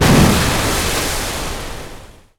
Pirate Ship Cannonball Splash; Zelda

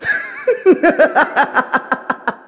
Distant Laugh; Ghirahim laughing from a distance; Ghirahim's encounter with Link, Zelda and Impa on the Temple of Time's bridge; Skyward Sword

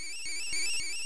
Ocarina Frog Song Revive

